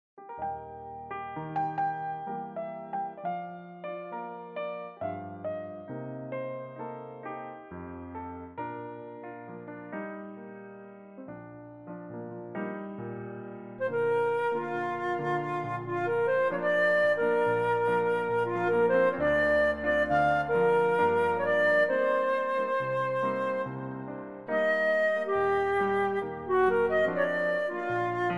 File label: Bb play referencia flute